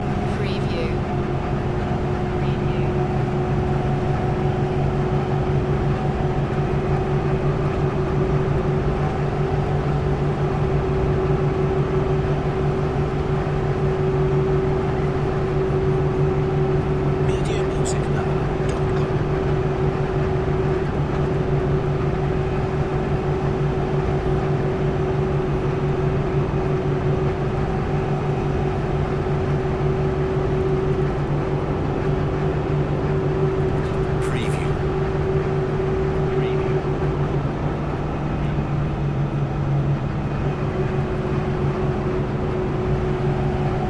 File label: fast bus ride loop